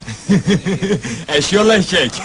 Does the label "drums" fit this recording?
No